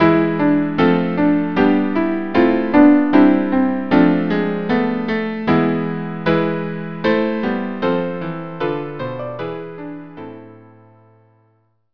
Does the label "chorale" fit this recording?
Yes